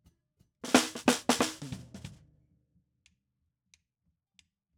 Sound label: SNR BTM